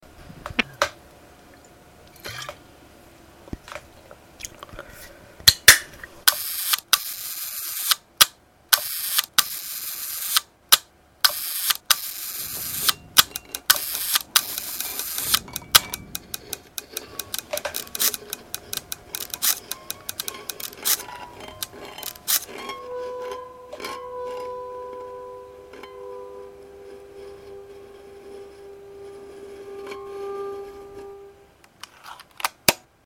click whir

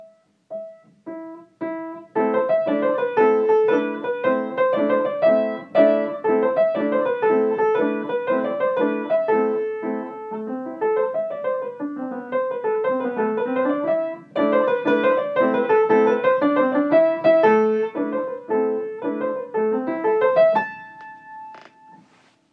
tarantella; grade 1

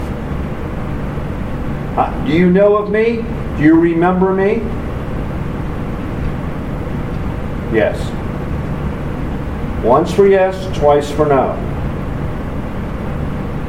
ferry passenger walkway growl; lewes ferry passenger walkway

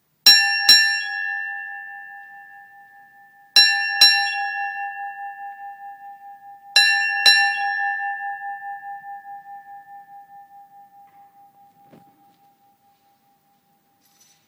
eucharistic congress bell

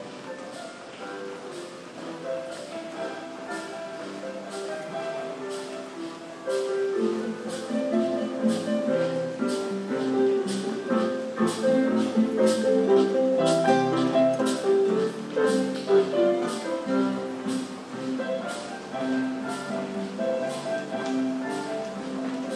old time busking

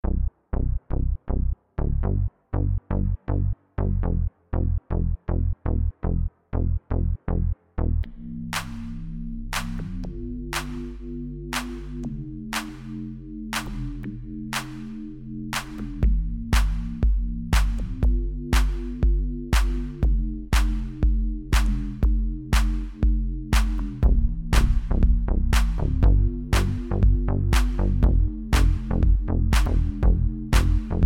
in a trance remix

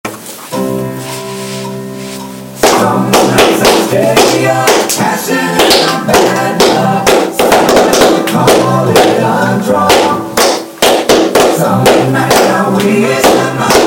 silent nights no click w clapping

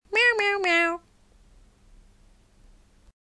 nite mew

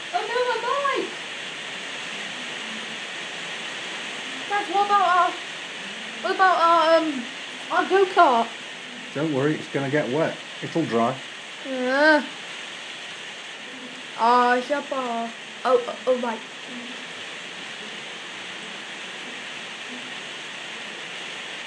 rain

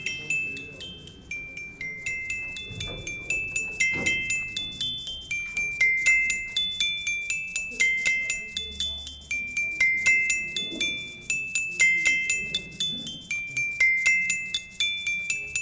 xylophone monome arduino; music hackday; mac

